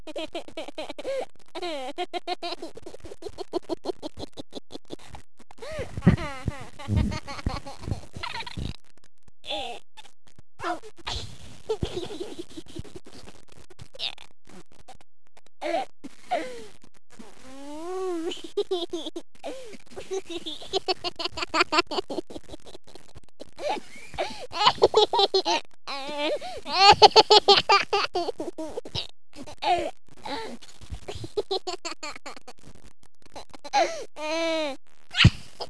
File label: giggles